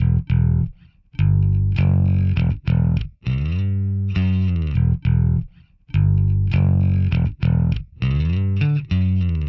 Indierock Bass Dmin; Pro Tools Essential Demos; Essential Loop Demos; Demo Session - Rock Hard; Digidesign

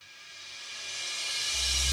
110 dance cymbal swell; Dance DJ; Pro Tools Essential Demos; Digidesign; Essential Loop Demos; Demo Session - Dance DJ

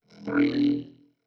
Neo-Wave Vocoder; Pro Tools Essential Demos; Digidesign; Essential Loop Demos; Demo Session - Pop Rock

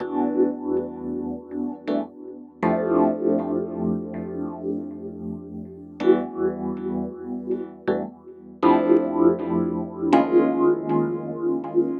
080 Chill Pop MarkII Emin, E-Piano Clav; Pop Rock; Pro Tools Essential Loops; Digidesign

